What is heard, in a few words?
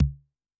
Tom, Yamaha DTXpress II